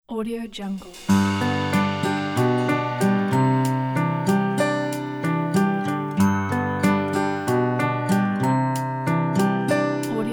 happy life; bluewhalemusic

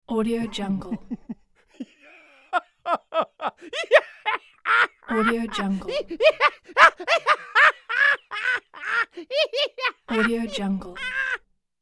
male belly laugh; sound-ideas

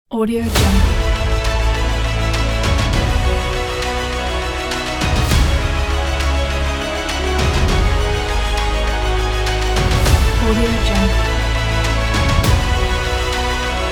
heroic epic ident

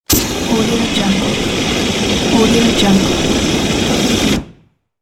flame bursting; wistansound

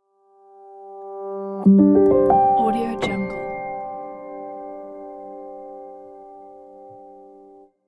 soft piano logo

